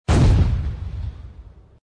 boom Fish Soud

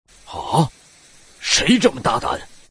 fish die

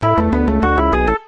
win

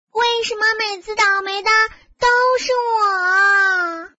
fish die

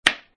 pai

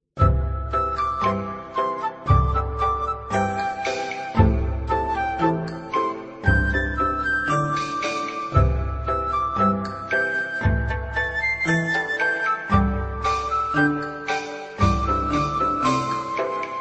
Music Normal

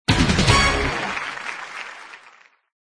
win

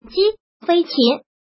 Chicken

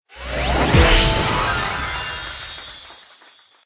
win; niu

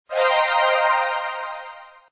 start

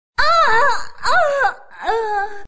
mermaid Die

